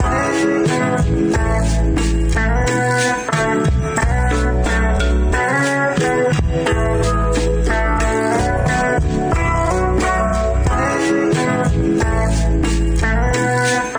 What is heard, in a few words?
water & rain